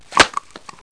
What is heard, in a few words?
SPLAT